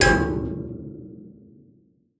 Tube Hit; Game rip; MiSide

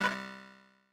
Console Change Folder Click; raw game audio files; CoreConsole; MiSide

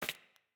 Step Wood Noise; MiSide

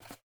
Take Mouse; MiSide

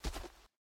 Step Ground; Game rip; MiSide

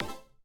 Click; MiSide

